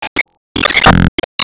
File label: Barrier Break